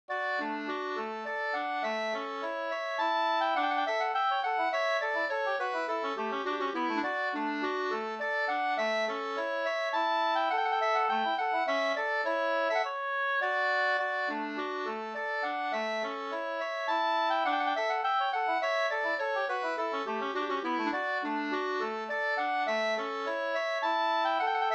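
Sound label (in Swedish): slangpolska, two-part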